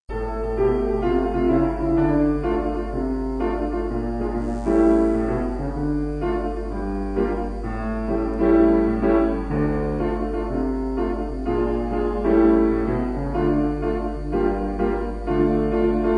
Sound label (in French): chats instr